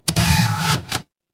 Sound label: mechanical machine